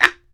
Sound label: BAMBOO, World Assortment